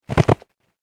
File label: Snow Foot Step; Footstep Sound Effects; human